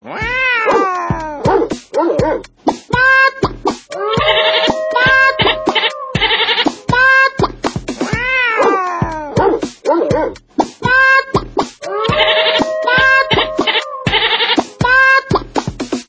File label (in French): chorale des animaux